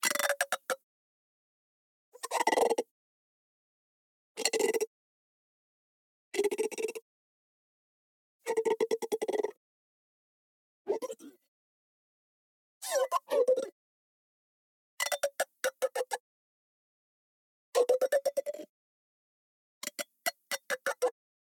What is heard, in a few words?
squeaks and creaks pint glass; fvtt sonniss gdc audio